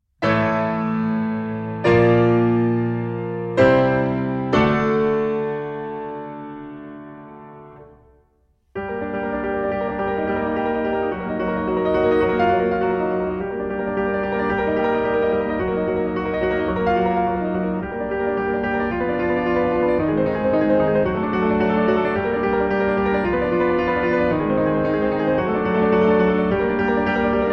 Études For Solo Piano

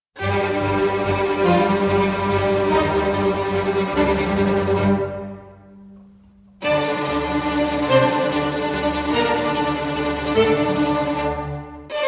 Violin Concerto No. 2 in G Minor Presto

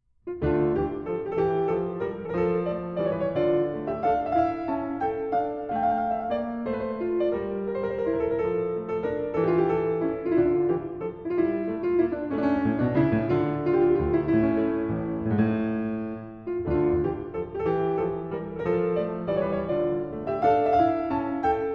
Pièces de Clavecin, Suite in D Minor, Courante; Book 1, Suite No. 1; No. 4, Courante II

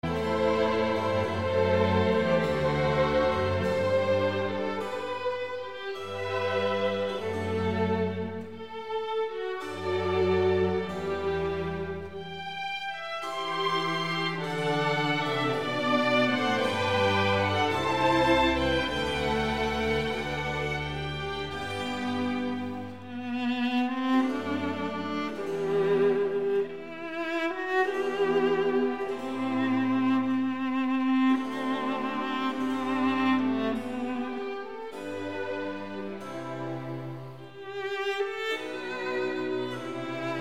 Viola Concerto in G Major I. Largo

